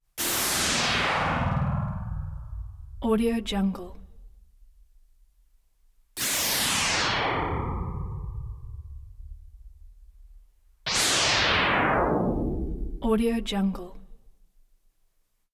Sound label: synth zap tones descending throbby